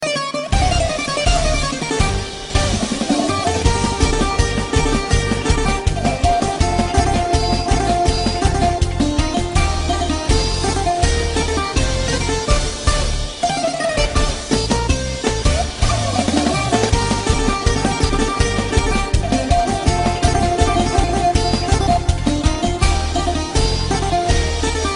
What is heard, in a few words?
nokia tune greek version ringtone